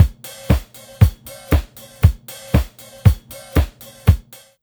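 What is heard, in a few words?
beat, right, roots disco; USB Soundscan vol.32; AKAI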